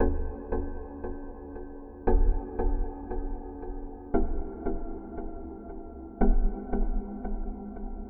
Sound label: Factory Quiet; OneShot Fading Memory